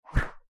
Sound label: Quick Single Rope Swi, swish, rope